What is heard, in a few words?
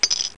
jackpot; Monster Media Number 15